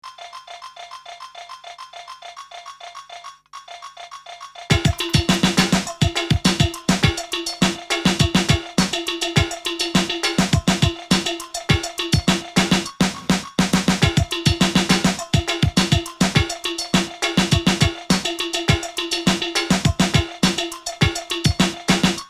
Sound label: 103 old school casserole beat